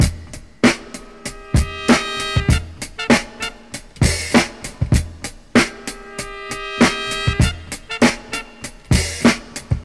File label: 97 bpm fresh drum loop sample d sharp key, kick tuned to the D# note